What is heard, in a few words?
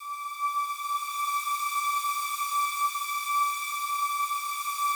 slow strings D5, Yamaha VP-1; 90 s Sample CDs; Music Store